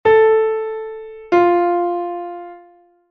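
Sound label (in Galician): 3ª Maior LA- FA descendente, INTERVALOS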